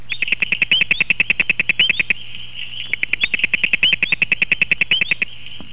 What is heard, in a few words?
spiny leaf-folding frog